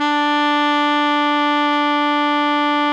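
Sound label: baritone mezzo forte D 3, Sax; BARITONE MF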